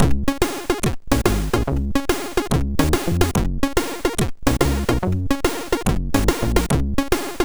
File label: Tests, MOS6581R4